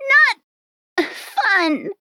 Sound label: death; stregi